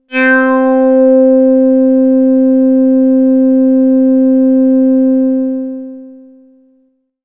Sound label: Sun Sigh B, synthpad